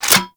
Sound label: Reload Cannon Insert; Grineer